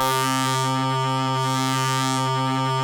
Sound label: 700 MOD1 C 1, Korg; Korg 700S; Back In Time Records; Korg Universe VOL-1